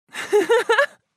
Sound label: Young woman laughing